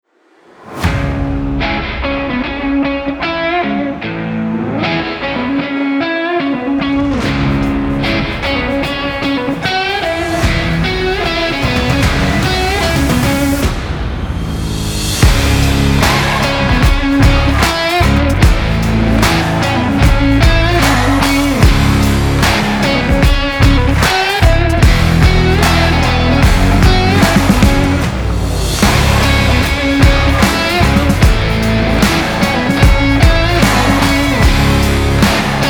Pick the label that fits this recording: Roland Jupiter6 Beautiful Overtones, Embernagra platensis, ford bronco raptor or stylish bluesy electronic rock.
stylish bluesy electronic rock